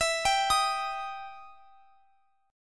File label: E Minor Major 7th E down; EmM7; arpeggiated